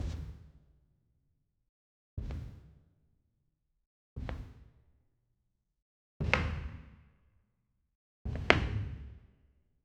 wood floor steps, small room; wood floor steps 4 6 step

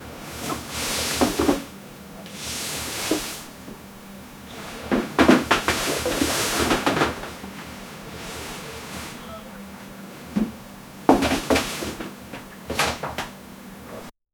someone shifting, bed

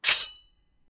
sword slash in air

